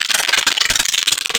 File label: Termite, Wavetables; Cymatics; Serum; Animals for Serum - Gold Edition